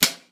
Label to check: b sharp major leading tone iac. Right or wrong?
Wrong